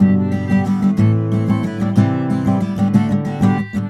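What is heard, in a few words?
guitar, 120, Celtic; USB Soundscan vol.42; Celtic Flavours; AKAI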